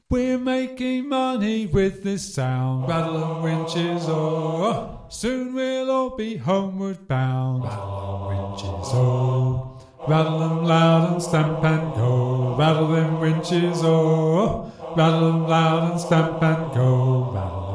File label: Rattle Them Winches - Bass; eccleshall singathon